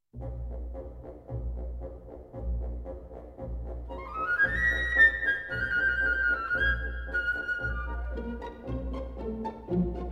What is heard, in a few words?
Chinese Dance